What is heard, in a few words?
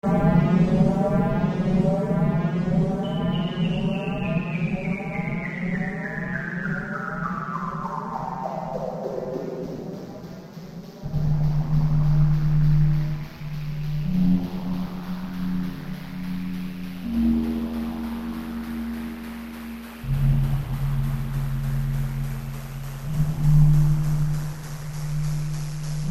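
frequency shift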